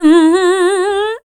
VOC F, Dance Vocals, FEMALE

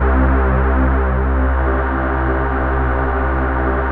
MOVE BASS; Masterbits; Vintage Voltage

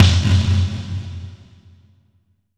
VERB SN; East West - Phat & Phunky

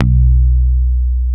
PICK B 2, Bass, ROCK PICK; Spectrasonics; Bass Legends

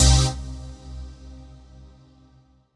HOUSE, House Dance

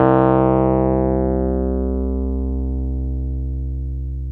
PNO Wurly; Keyboards of The 60's and 70's

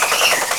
Applause; SNES; Total Football

